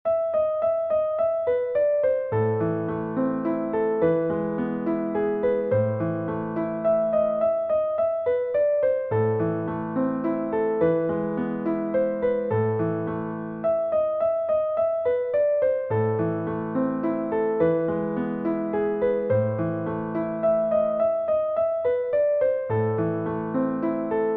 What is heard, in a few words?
piano; f r elise beethoven for beginner